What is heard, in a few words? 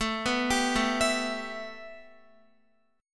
A 7th Suspended 2nd Guitar; A7sus2; arpeggiated